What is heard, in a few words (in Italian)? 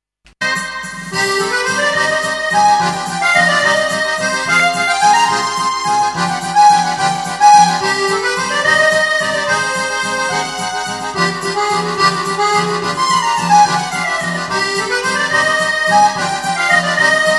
valzer; Valpurga